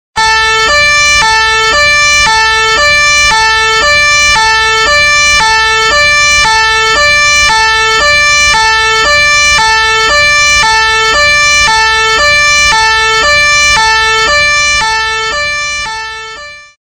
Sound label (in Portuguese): toque de sirene ambulancia